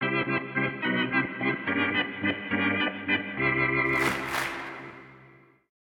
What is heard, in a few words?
organ